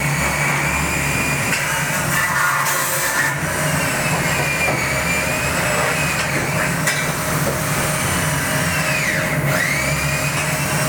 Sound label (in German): Akkuschrauber und Flex